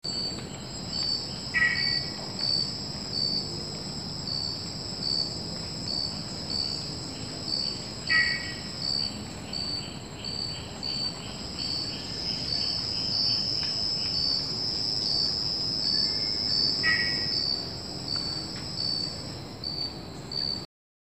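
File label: pelobatrachus nasutus, Malayan Horned Frog call; Upper Peirce, Singapore, 08 Jan 2019, 1900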